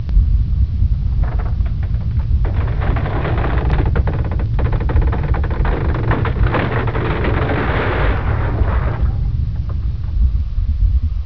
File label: Earth Quake